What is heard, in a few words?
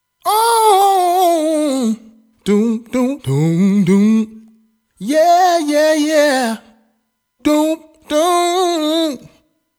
Vocals Original; IGS Audio One LA